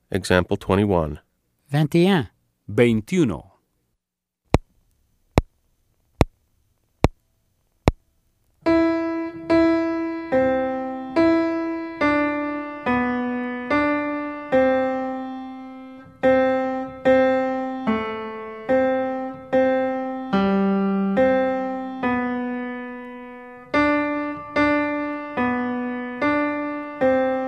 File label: Keyboard